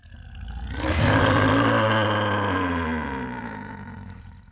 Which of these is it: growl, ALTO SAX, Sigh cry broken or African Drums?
growl